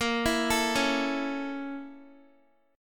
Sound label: Bb Minor Major 7th Flat 5th, Guitar; arpeggiated; 4F,4A,5C,5G,5C,6E